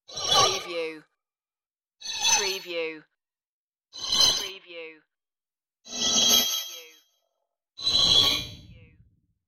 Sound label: digital passing slides